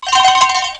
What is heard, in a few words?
bells; PUZMANIA